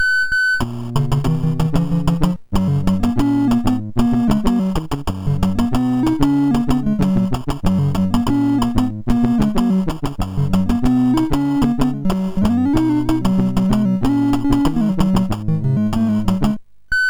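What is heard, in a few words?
MOS 6581 SID chip music, games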